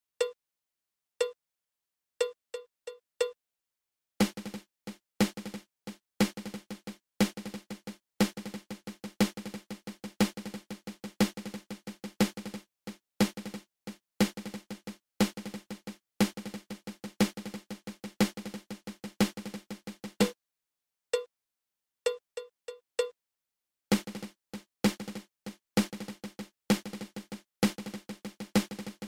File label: Drag Paradiddle